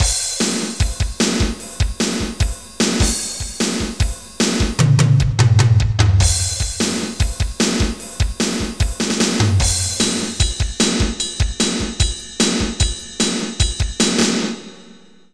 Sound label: drums; Roland; An Invitation To The Roland World Of Music